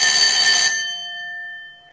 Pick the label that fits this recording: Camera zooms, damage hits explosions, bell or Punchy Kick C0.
bell